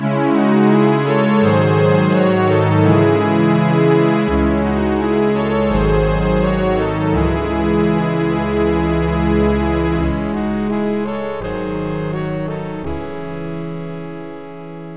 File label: Defeat; Dune II Battle for Arrakis; MS-DOS